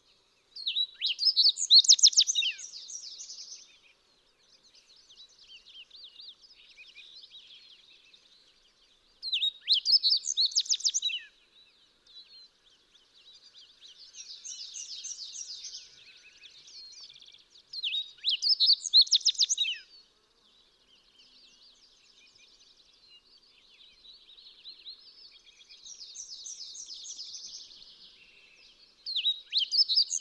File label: Fox Sparrow